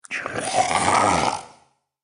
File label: Beast Monster; horror; Andrew Fleming